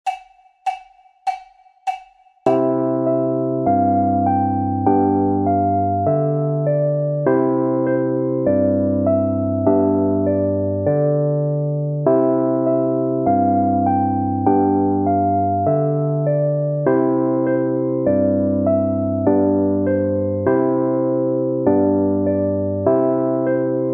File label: PIANO PLAYBACK